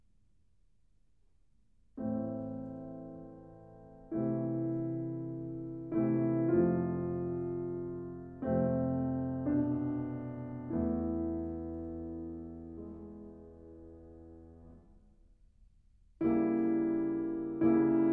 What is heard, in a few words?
Small Piano Pieces, Largo con estro poetica; Grieg; The Complete Music For Piano